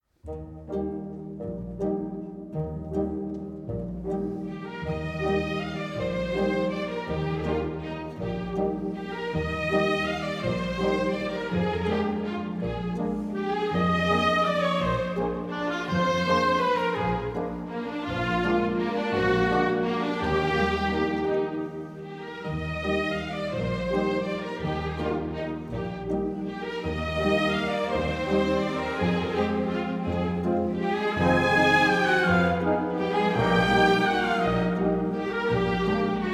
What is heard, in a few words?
Delibes Waltz